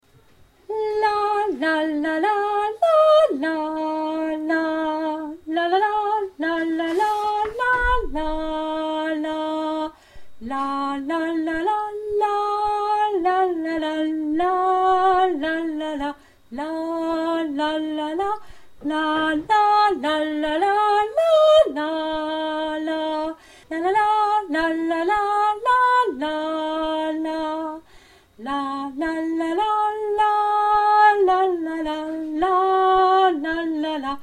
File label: tenor